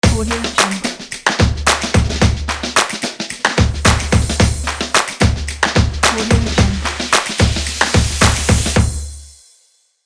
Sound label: the claps and drums logo; blueeagleaudio